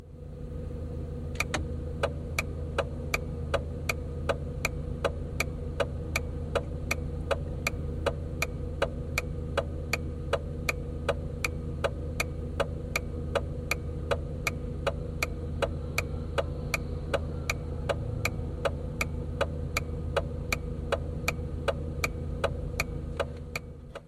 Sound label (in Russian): zvuki povorotnikov u avtomobilia